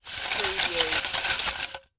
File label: Paper Spinning in Metal Pot (Ticket Cage)